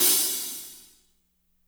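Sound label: closed hi-hat; Spectrasonics; Burning Grooves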